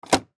Arcade Racing Game, Arcade Stand Up Racing Game Gear Shift Low To High; Technology; Blastwave